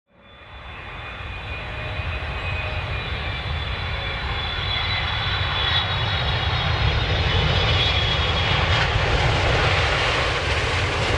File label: Airplane Landing Sound Effect